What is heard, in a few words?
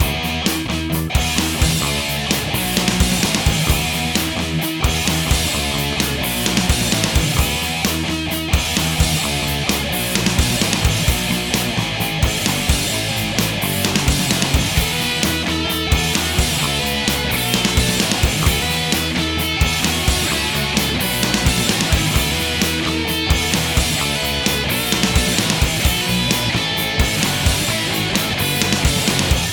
Hard Rock Loop